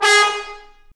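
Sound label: trombone; Roland L-CDC-01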